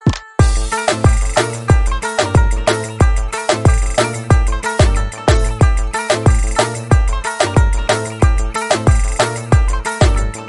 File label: Instrumental BGM